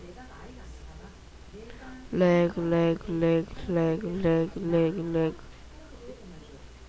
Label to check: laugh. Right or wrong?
Right